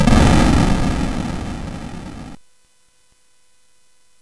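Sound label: Moon Patrol, MOS 8580 SID chip music, games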